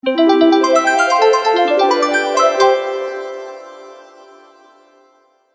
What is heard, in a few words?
News Message, Cartoon; Cartoon Sound Design Sound Effects